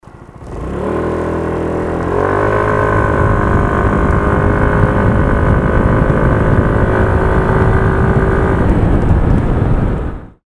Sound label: cygnus x normal acceleration